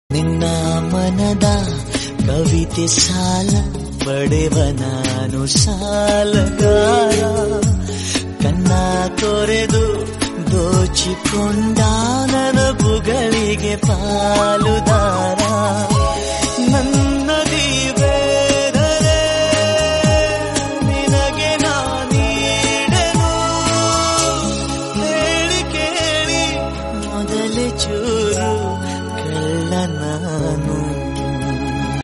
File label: feeling songs kannada